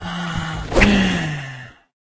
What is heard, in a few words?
ice zombie lady attack